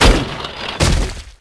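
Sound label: hover death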